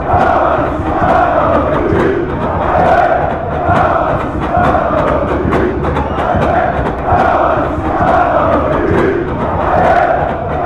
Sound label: chant; olympiacos